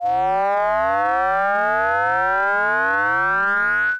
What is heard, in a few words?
Shepard Tremolo